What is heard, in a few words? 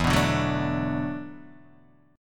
E 9th Suspended 4th E down Guitar Open D